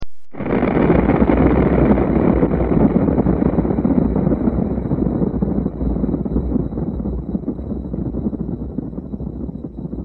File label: nuclear explosion